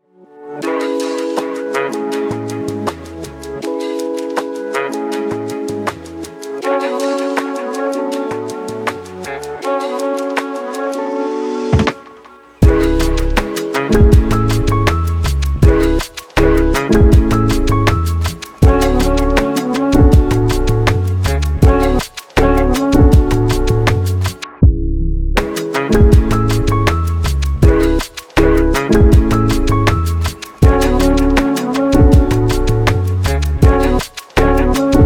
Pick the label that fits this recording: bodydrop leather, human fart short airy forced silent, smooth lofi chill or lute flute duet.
smooth lofi chill